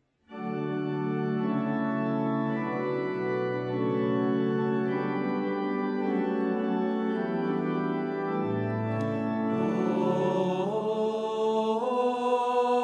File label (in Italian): tenori